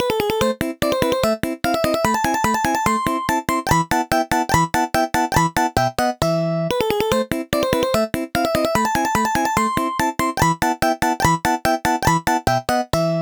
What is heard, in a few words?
slap bass